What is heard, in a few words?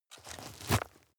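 step dirt heavy boots scuff, footsteps; schritte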